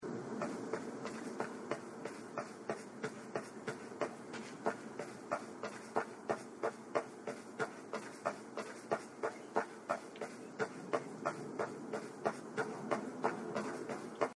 walking; Titan Souls

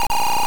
changes direction when running; sonic the hedgehog 3 knuckles

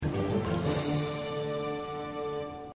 quest recieved; The Witcher

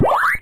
eat ghost; Pac-Man

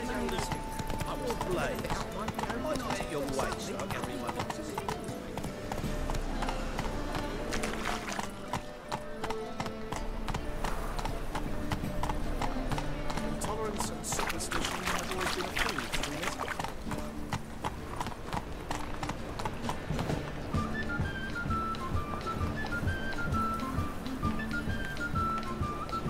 city; The Witcher 3

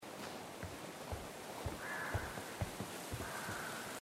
walking wood; Life is Strange 2; gamesoundopedia

